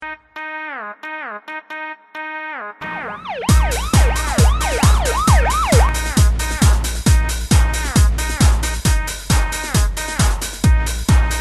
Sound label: alarm